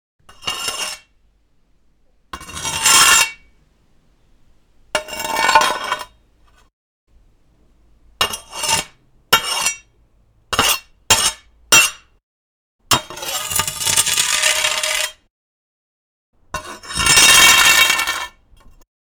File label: Machete Scraping On Concrete